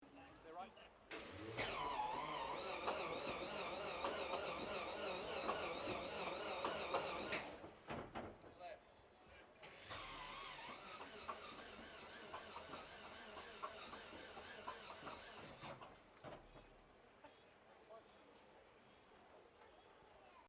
AIRCRAFT LOCKHEED HUDSON PRE START, twin engine light bomber; 1941; Airplanes; Vehicles